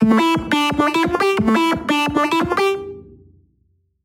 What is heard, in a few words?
Lead; neuro; InsideInfo